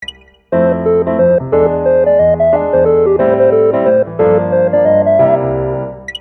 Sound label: Chord 7